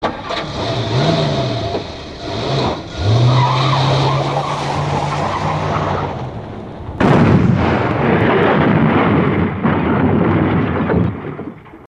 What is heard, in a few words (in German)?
Wagen fluechtet; Korngeister